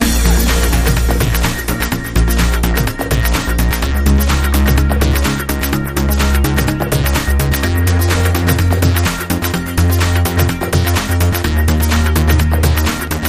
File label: Vibe Electro